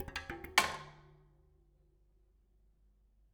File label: Bicycle Spokes